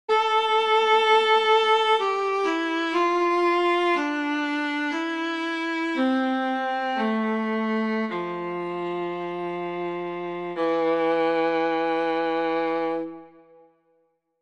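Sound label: VLA solo legato, viola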